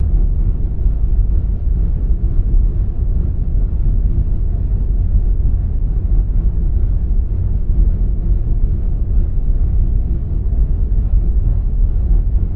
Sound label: Propeller Movement Underwater